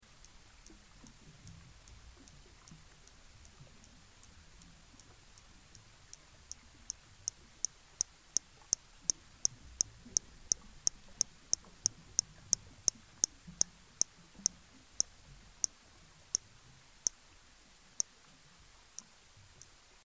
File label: maui recording, maui hectors dolphins; conservation, native animals, marine mammals